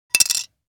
Screwdriver dropping onto cement

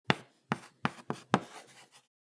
Chalk stick writing on blackboard

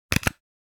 Paper hole puncher single punch

